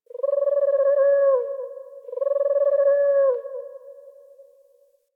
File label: Night Zone Tree Critters